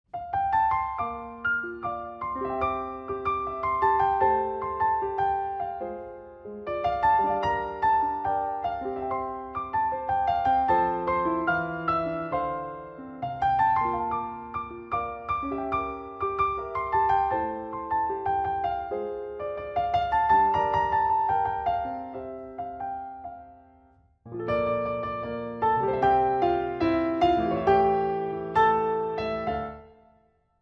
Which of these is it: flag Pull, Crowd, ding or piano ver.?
piano ver.